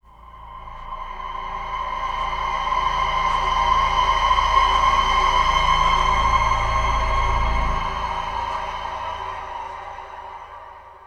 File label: IMPACT SCARY, Cinematic Impact; M-Audio Pro Sessions; Liquid Cinema